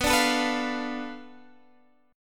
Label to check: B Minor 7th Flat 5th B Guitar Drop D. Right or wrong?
Right